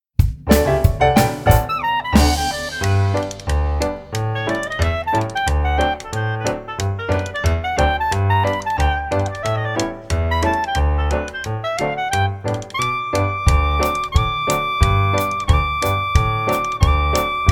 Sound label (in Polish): Siedem waliz wersja instrumentalna